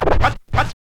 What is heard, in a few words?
scratch short, Vinyl scratches